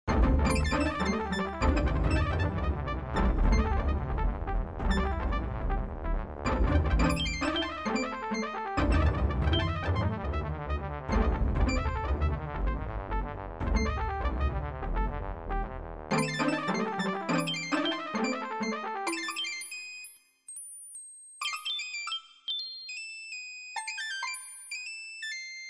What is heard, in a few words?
jazz scale